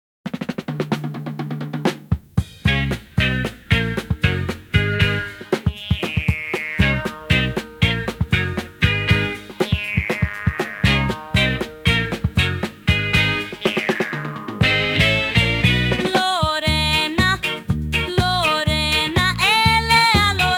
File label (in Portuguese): Versão Reggae